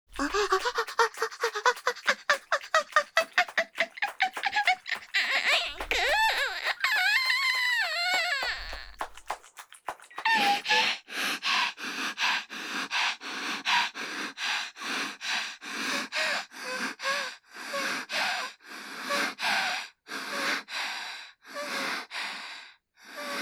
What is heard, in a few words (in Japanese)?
絶頂（SEあり）; 高潮